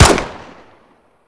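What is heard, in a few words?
usp unsil, weapons; csof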